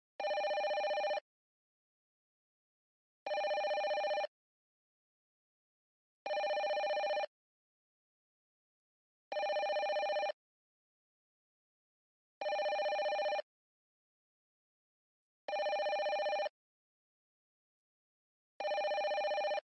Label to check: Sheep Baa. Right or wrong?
Wrong